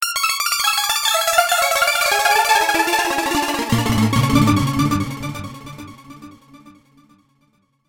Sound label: arpeggios arpeggio